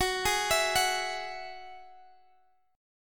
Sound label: Gb 7th Suspended 2nd Gb down; Gb7sus2 arpeggiated